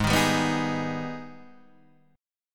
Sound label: G sharp Minor Major 7th, chord; strummed; JGuitar